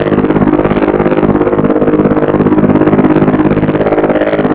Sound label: engine loop, manhack; hl2